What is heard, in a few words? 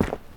footsteps; smite; chaac